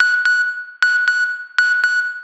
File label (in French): Son Alerte